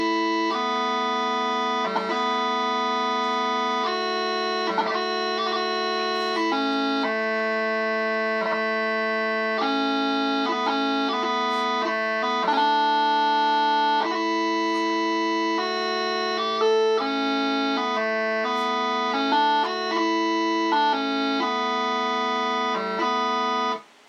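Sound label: Mist Covered Mountains, bagpipe tunes; weddings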